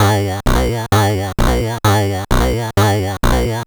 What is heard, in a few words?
MiniBrute Loop, 130BPM